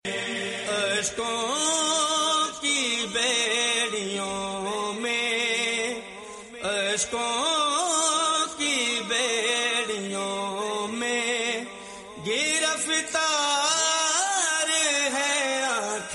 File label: noha tune ringtone